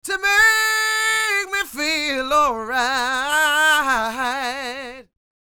SING, Vocal Planet Blues Soul BLUESY

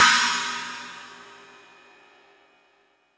Stadium Rox, Drum Machines

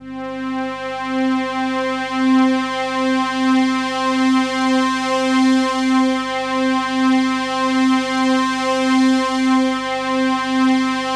PAD C5; USB Soundscan vol.05 - Explosive Jungle; AKAI

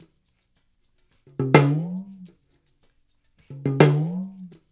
loop, udu; InVision Interactive; Percussion Slam; UDU-TALK

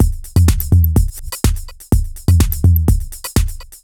C 125bpm, House Drumloops; 90 s Sample CDs; Zero-G House Fabrik Drumloops; ZG Drumloops

